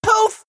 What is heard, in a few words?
poof; Meepo